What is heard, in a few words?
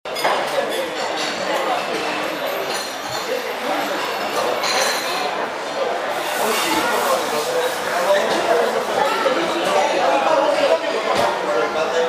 cafe railways station, Spanish street recordings